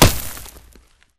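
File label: Bullet Light Ballistic Dirt impact; Titanfall 2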